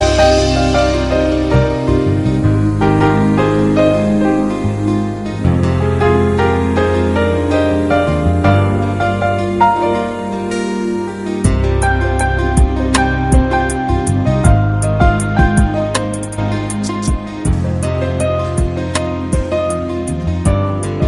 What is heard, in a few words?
love bgm; vinnaithaandi varuvaayaa